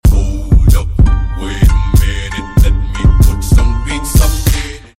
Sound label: Kush Beats